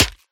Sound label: close, clams; infestation